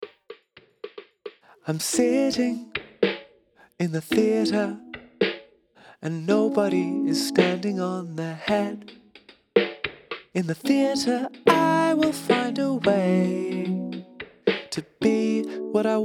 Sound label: business flute